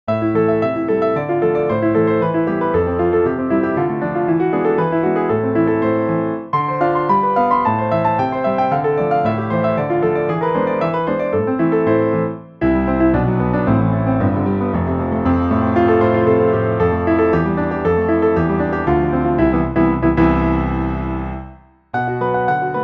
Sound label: YC5 Solo; Etyde